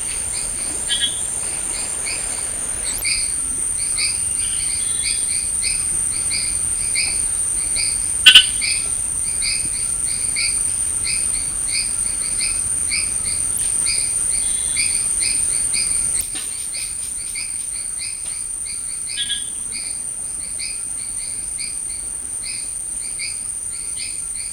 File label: Pristimantis sp 2